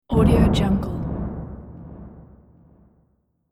atmospheric impact; film and game

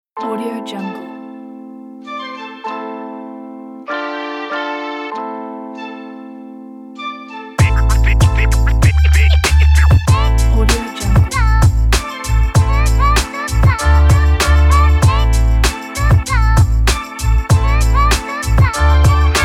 hiphop

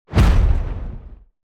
elements fire whoosh hard; smartsoundfx

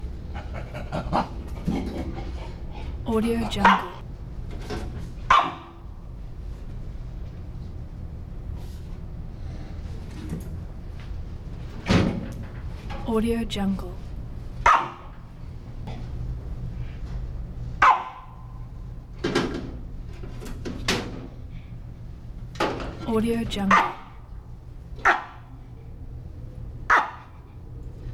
baboons excited barks; prosoundfx

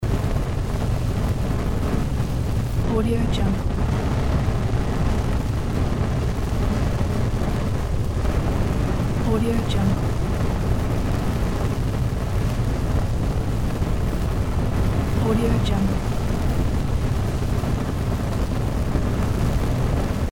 fire in furnace

